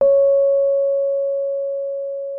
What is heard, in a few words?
C#5, RHODES; Xsample VOL-1